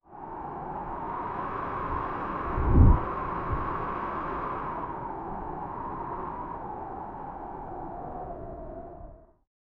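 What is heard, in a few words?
wind blowing from the left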